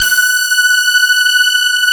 CMI PIANO; Wizoo; Powered DX

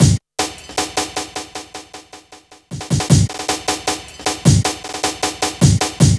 155 Hard Step, breaks; m8 breaks

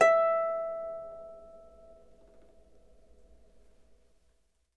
Harp E5; Miroslav Vitous - Percussion & Harp

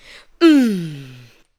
ADLIBS

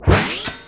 tape fx, scratch; Ambient Grooves

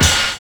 PLANE BEA; Univers Sons

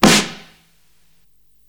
Snare, Psychedelic Drums

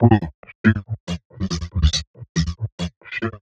140 vocal beat, Vocal Loops; Transmission-X

